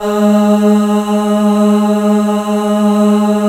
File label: voice; Greytsounds; F2-SYNCLAV 2